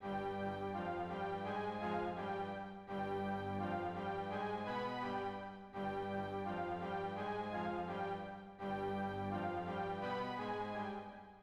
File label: Strings